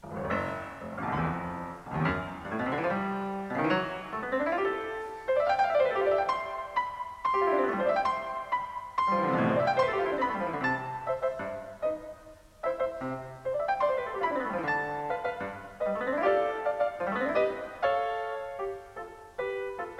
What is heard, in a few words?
Piano, Smith Hall